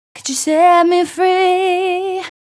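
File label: Chorus Female Vocal Dry, Gb, 126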